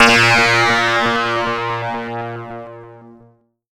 A#, Synclav FM; Big Fish Audio; SPACE1

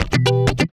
GUITAR

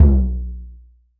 SURDO; AKAI S-Series CD-ROM Sound Library VOL-5

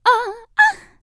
Vocal, R&B & Dance, FEM. HUMAN

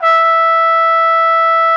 E5, TRUMPET; Xsample VOL-3

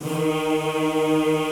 D#2, Extended Classical Choir; Best Service

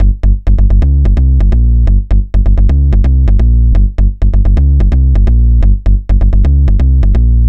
Jump Bass; Dr. Fink's Funk Factory

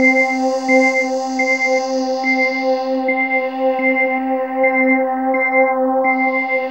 SYN MORP, Roland; Sound & Vision - Gigapack II; Morpheus

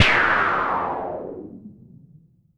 Sci fi

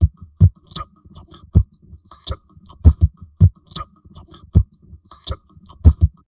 TALK, Drum Loops Crazy Processed

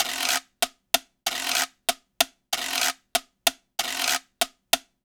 Quiro, Latin Percussion

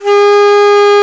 DIZI, Winds; E-MU Producer Series Vol. 7 – Old World Instruments

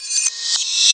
Shards; Jungle Warfare 1&2

